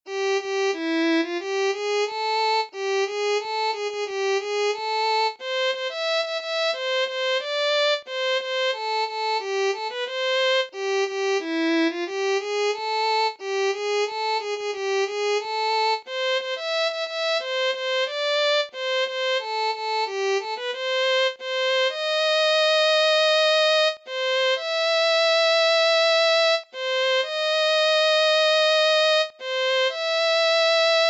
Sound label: Violin